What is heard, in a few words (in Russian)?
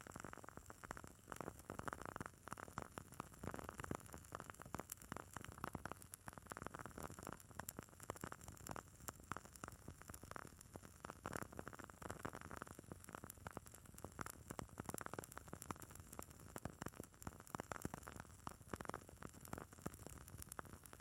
stereozapis s ispolzovaniem h4n pro iz dvojnogo derevyannogo fitilya plameni svechi